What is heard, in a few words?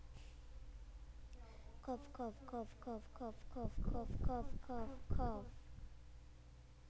cough